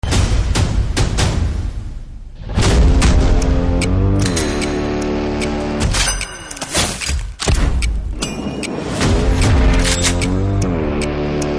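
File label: Hindi background music Ringtone; Saaho Teaser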